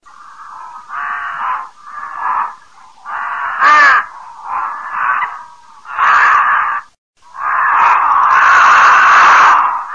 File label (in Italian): corvo